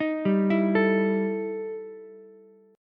E Minor Major 11th E Ukulele C6; EmM11 arpeggiated; 8,7,12,12

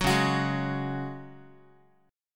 Db Suspended 4th Db, Guitar; strummed

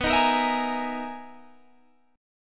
B Major 13th B, Guitar, Standard; BM13; strummed; JGuitar

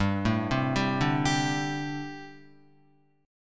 G Suspended 2nd Suspended 4th, Guitar; Gsus2sus4 arpeggiated; JGuitar

